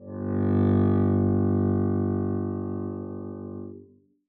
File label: arco g1 f down, double bass